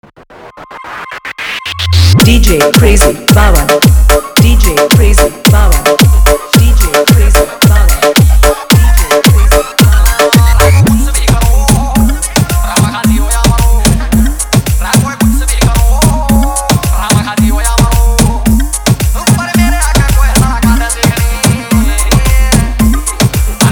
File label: Remix Song